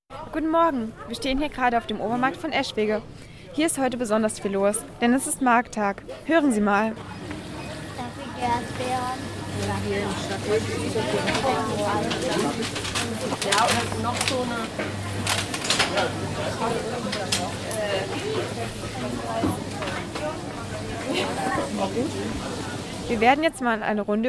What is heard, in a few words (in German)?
Glockenspiel